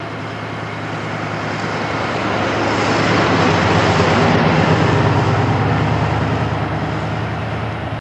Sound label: Car By Slow